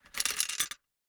Gumball machine, metal, glass, candy, switch, mechanism, rotate, dropping, clatter, wide; Eiravaein Works; Vaeyan IV